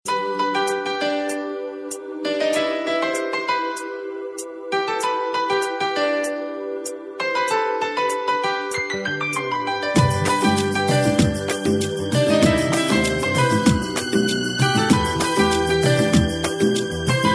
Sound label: piano, ringtone